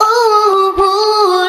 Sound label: ooh SUNG, Voice